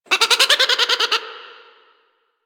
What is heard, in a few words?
Drifter Camp Bird Close; Ambience; One Offs; Birds; Warframe; Quests; The New War